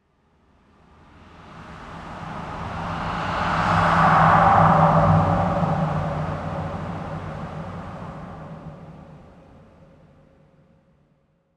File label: Car self, Aeolian Voices